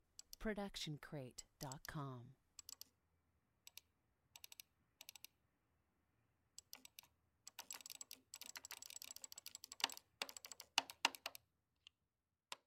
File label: mouse clicking various; soundscrate